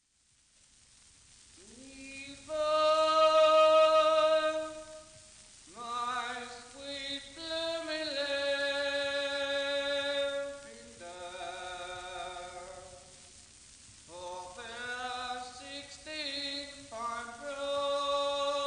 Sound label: Lavender seller song